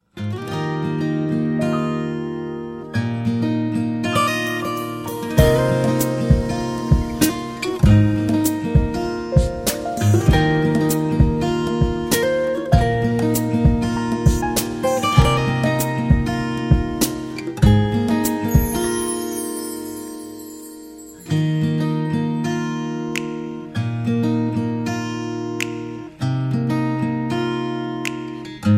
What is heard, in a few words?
guitar magic